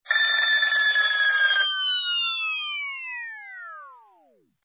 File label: Warped Ring, Ringtones